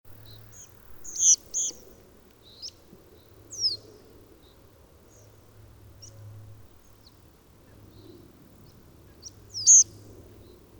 crbun schoeniclus FC treepip trivialis FL FC; werb-see

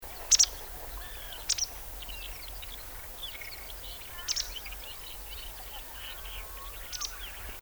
wwagt baicalensis; telmen nuur

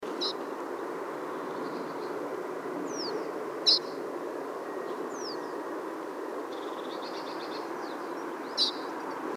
crbun schoeniclus SC FC; werb-see

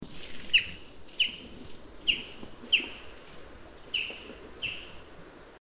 cchaffin coelebs M FC; groie

